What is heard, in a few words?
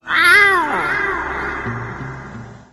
meow; ocelot